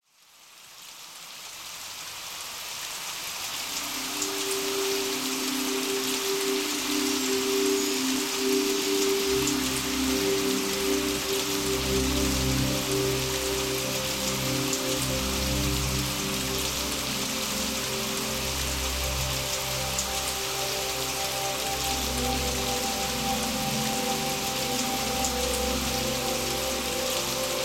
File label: Healing Rain with calming relaxation music